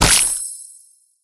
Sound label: blocker popsicle destroyed; Candy Crush Friends